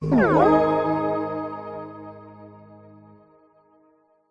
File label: multimedia game tone short positive complete